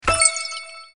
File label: card boom; effects